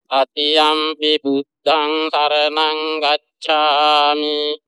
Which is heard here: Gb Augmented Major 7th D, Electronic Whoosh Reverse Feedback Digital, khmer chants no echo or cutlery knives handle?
khmer chants no echo